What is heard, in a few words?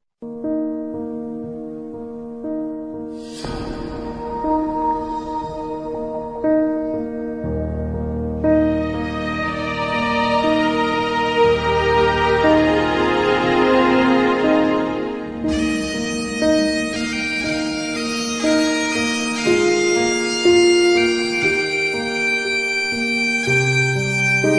(bagpipes, orchestra)